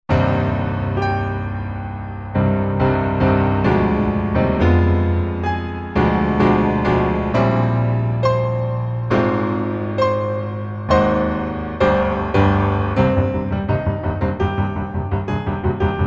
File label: bgm